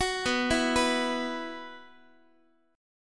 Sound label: B Suspended 4th, Guitar; arpeggiated; x,x,9,9,8,10